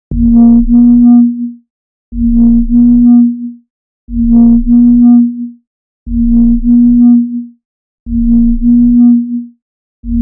little vibration made wit, low

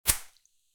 short high pressure water

